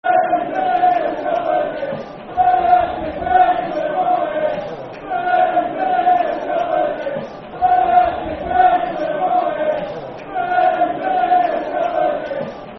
Dirty Leeds Are Rubbish, Football Chant (Soccer Song); Stockport County Scfc